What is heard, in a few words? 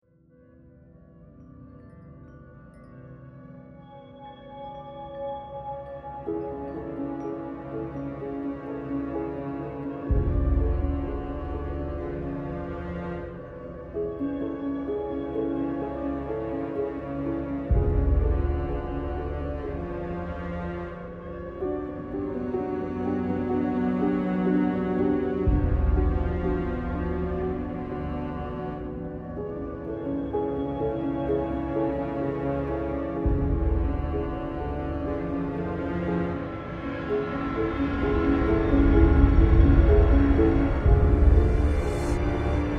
TRAILER